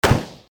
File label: shot